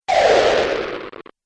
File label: Science Fiction Laser Distorted Fall; Laser Sound; Sci-Fi Laser Sound Effect